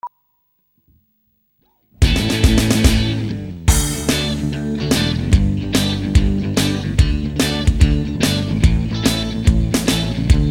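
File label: instrumental; Macca's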